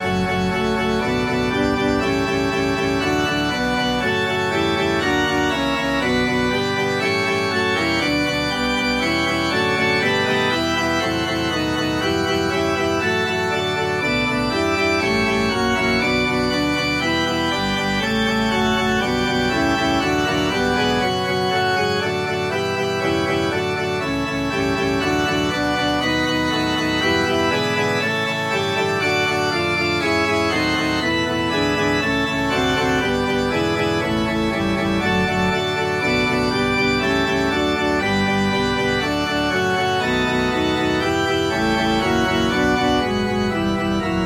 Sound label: organ